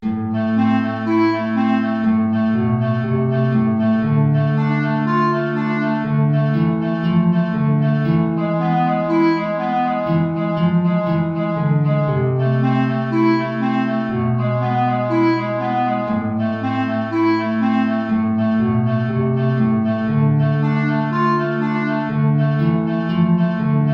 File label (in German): Allegro (Klarinette in Bb & Gitarre) (tief)